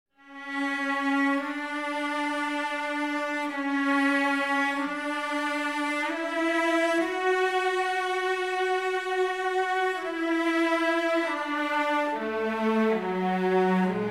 Cellos and violas (stereo - starting with cellos only panned right, joined by violas; Alan Parsons & Stephen Court - Sound Check 1993